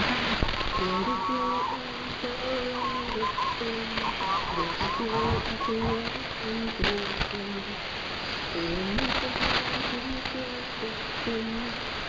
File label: 4.80MHz